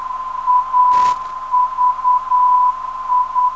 390kHz, ndb

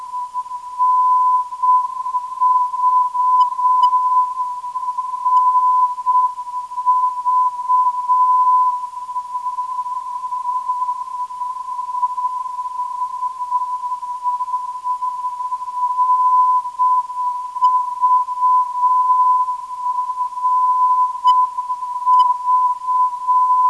515kHz, ndb

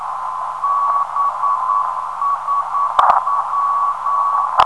521kHz, ndb; BSW

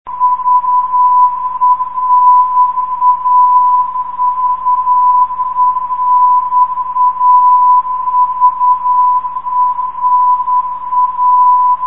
350khz, ndb; vena

